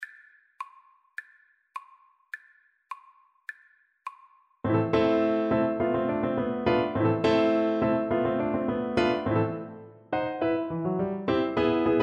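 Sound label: VLC karaoke, Cello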